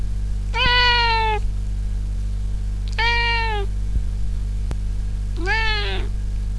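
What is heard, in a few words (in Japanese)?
nakigoe, cat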